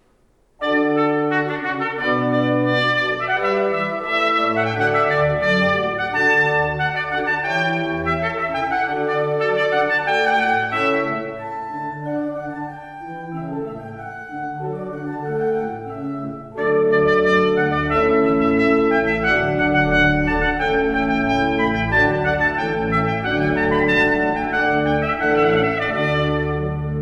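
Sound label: voluntary no1 tpt org, trumpet organ; weddings; boyce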